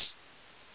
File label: hihat